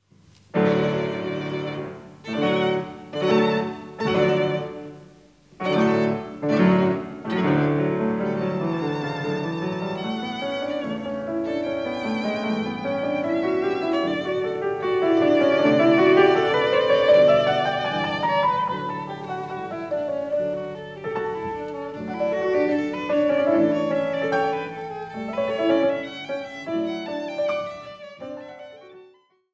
Sonata No. 1 in D for Violin & Piano Op. 12 No. 1 I. Allegro con brio; Beethoven